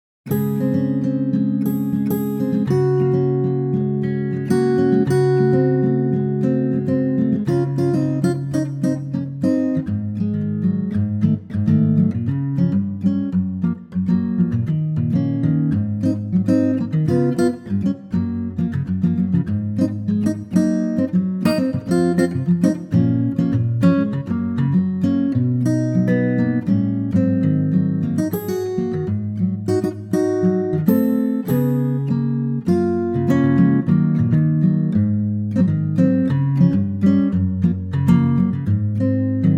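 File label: Db Ac Guitar, male; key - Db - vocal range - Ab to Eb; wonderfully fresh acoustic guitar arrangement; West Side Story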